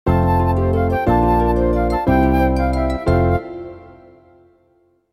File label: JINGLE Friend; Monster Girls and the Mysterious Adventure 2; windows